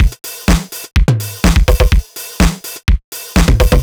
drum monday full 125, Drum Loops, Classic Chicago House